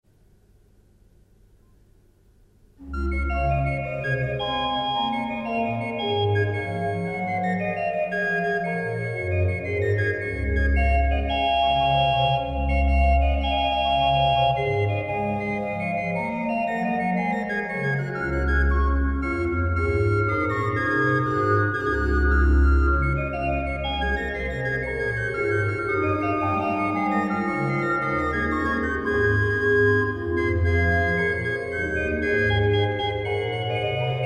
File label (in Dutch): Holfluit en Woudfluit